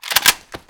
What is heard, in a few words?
ak boltpull; ak beast; csocf weapons pack